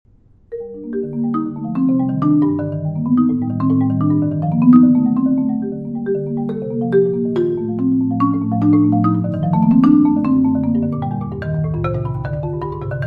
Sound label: G Minor Prelude